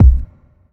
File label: solid bass drum one shot b key; kick drum samples